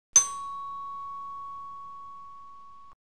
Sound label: bells chime